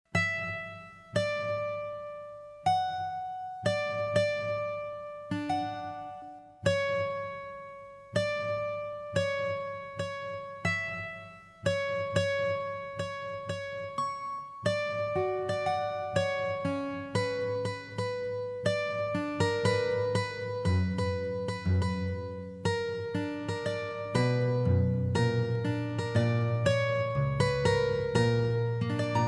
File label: Guitar